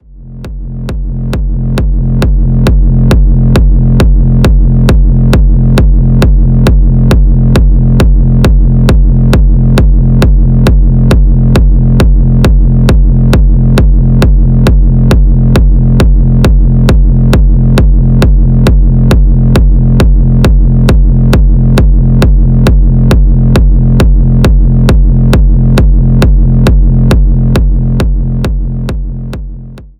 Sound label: signature kicks; zumstein